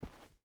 footstep rubber boots cloth walk; Rust

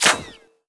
impact helmet ric; Escape from Tarkov

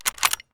mosin bolt; Escape from Tarkov

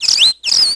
birds; World of Tanks Blitz; hangar 9 may

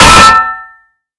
Projectile Sounds; Red Orchestra Ostfront 41-45

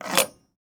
eoka pistol rock scrape; Rust

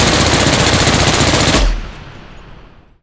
plane; World of Tanks

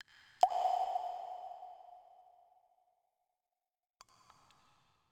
water drip; Rust

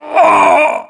death, voiceovers; ModernWarfare; localized english

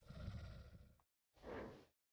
sleeping; Rust

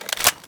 scarh clip in; Escape from Tarkov

